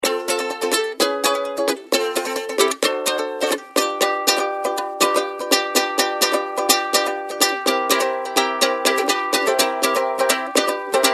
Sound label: fireball uke